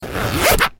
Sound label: zipper fast